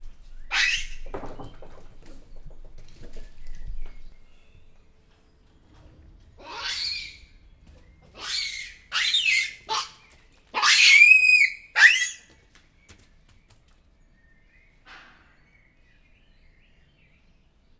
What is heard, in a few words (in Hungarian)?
elmaradhatatlan visítás, galléros pávián; pecsi zoo 2017